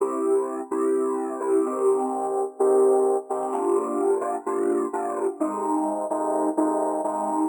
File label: 128 C Dry, melo filter loop; RoBKTA Sample Pack Supreme